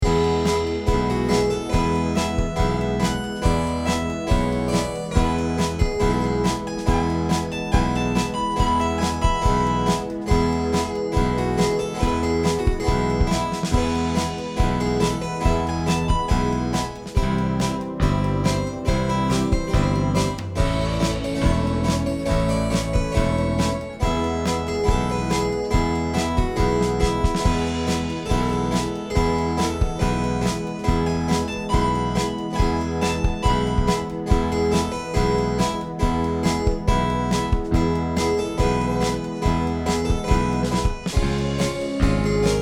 guitar

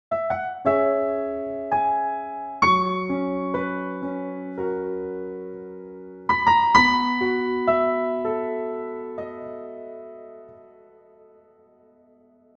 hammer test C3, piano; Pianoteq

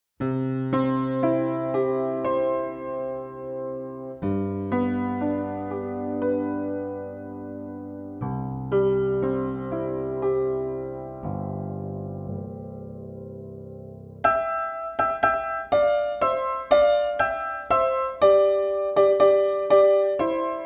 Steinway square

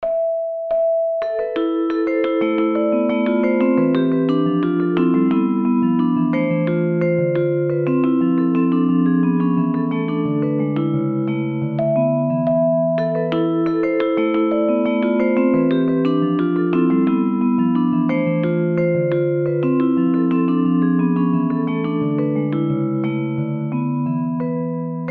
Coro Vibraphone

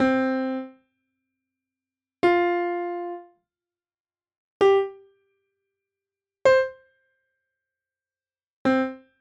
Pianoteq Staccato; Undamped strings resonance

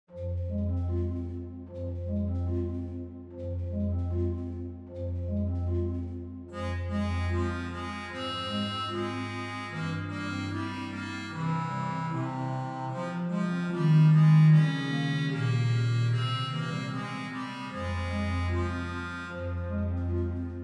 OrganTeq Reed Solo

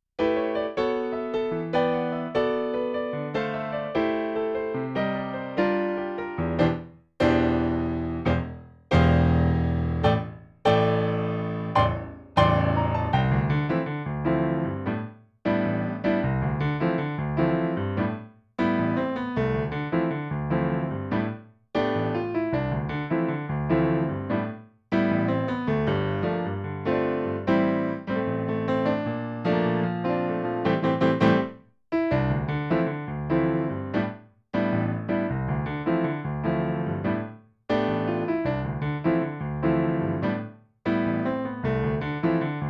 jazz trio

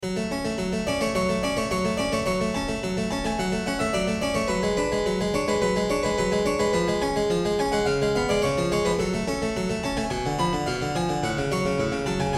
harpsichord; W. A.Mozart - Symphony 40; Pianoteq; Modartt

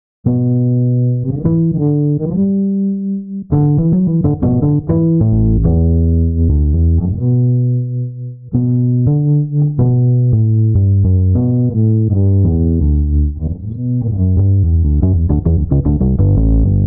Bass Guitar Test

